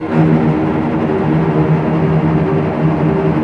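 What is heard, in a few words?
cello tremolo D#1; Digital Sound Factory Virtuoso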